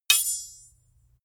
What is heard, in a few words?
sword hit sweetener